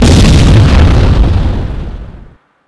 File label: Weapons Explode